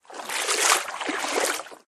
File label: water wade